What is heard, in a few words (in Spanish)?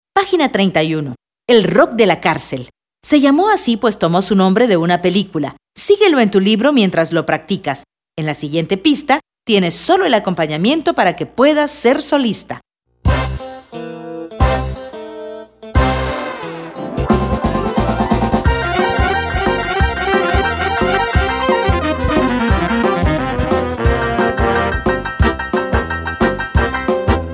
ROCK CON FLAUTAS